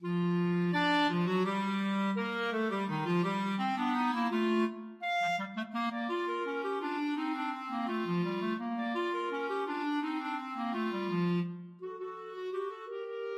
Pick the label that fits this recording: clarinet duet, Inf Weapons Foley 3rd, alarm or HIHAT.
clarinet duet